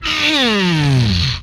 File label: death, vocal; MMRetroArcadeSoundsPack